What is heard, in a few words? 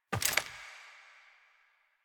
Steps